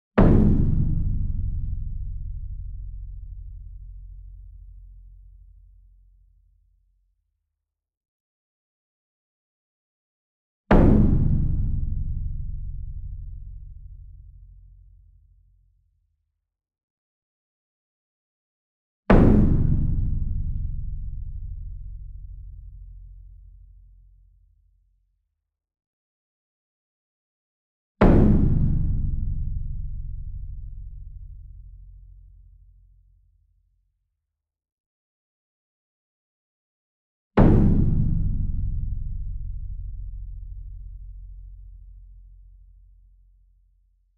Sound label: SINGLE DRUM Gong Hit Soft Beater Medium; boom library; Cinematic Strikes Construction Kit